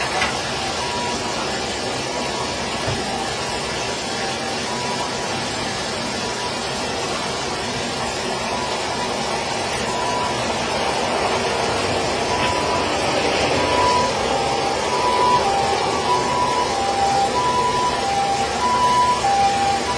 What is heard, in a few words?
bath siren middle